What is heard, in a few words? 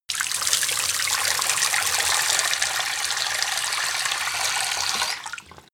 tap water run; gastronomie; berufe